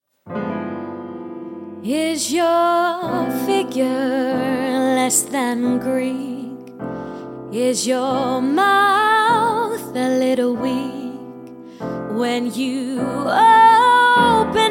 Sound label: jazz